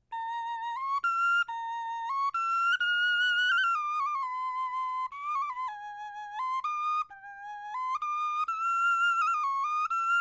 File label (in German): melodie